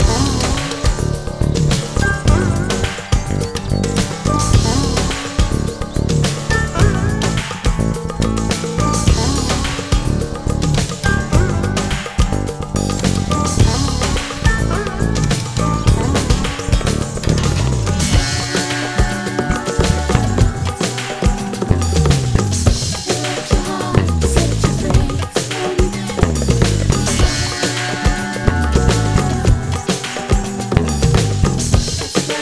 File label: Instrumental; Walt Disney's The Jungle Book Rhythm n Groove; PS1; PS2